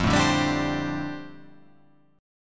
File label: F 6th Add 9th F down, chord